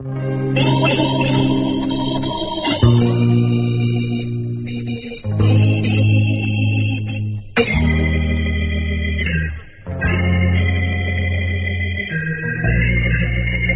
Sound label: keys, Sad